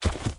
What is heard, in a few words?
Footstep